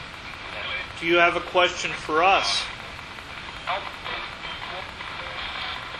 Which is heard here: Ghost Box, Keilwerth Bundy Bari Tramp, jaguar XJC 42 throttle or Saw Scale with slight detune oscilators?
Ghost Box